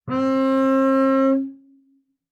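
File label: Bass arco fortissimo sul D C4, Double Bass; MIS Pitches - 2014; Strings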